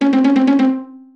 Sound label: jingles pizzicato; Music Jingles